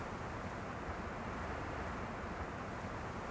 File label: wind; cs16; ze landlubbers fabi